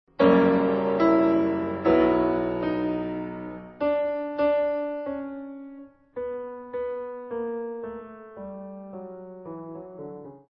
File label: Fugue